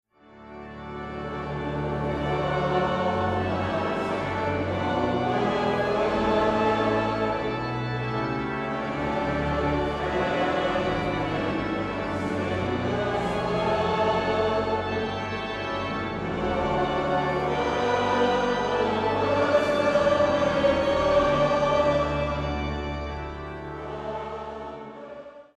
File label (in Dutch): Samenzang Psalm 150